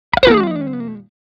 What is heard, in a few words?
Electric guitar slide